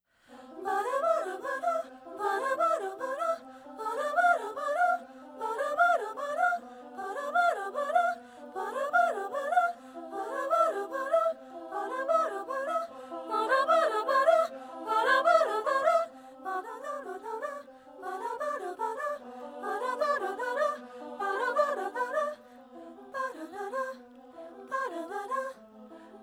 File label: VOCAL PRELUDE SOP; satb; partbypart